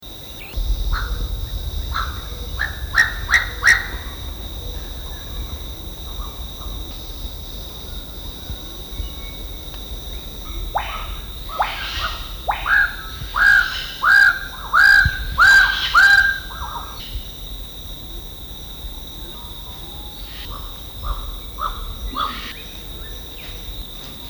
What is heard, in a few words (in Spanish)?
Paradisaea decora ave del paraiso sonidos; goldie